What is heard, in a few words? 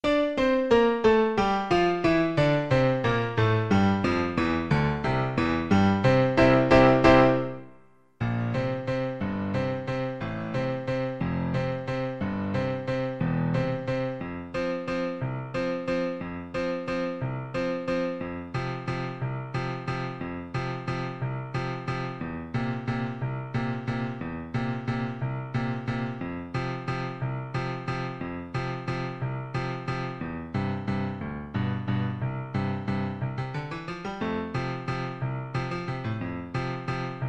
Oboe